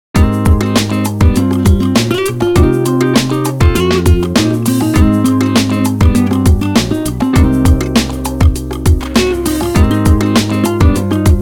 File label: GLORIOUS SAMBA